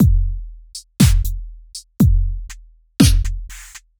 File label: Pounder, Loops